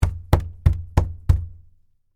Door Knock Open, Foley, Door, Knock, Open, Hand, Hard, Wood, Door